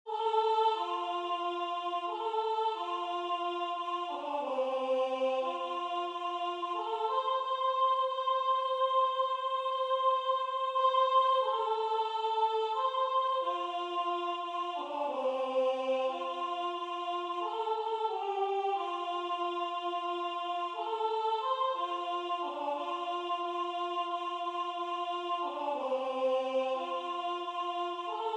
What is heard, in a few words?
Soprano